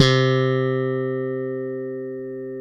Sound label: POP BASS, Electric Basses; PlatinumPhattCD2; E-MU Producer Series Vol. 8 – Platinum Phatt